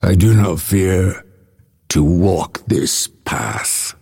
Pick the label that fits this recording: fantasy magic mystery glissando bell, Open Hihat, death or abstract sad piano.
death